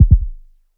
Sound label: VFX2 Sub